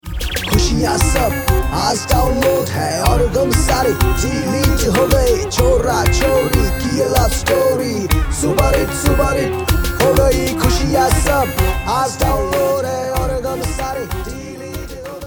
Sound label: wedding wishing song Hindi rap male